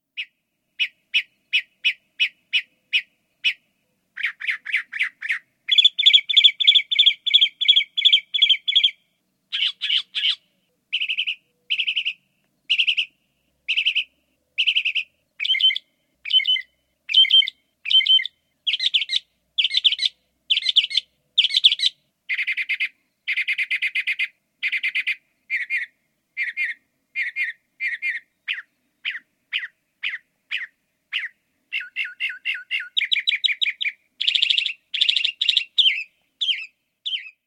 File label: mockingbird